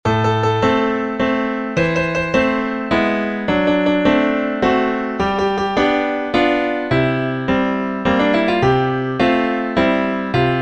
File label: Waltz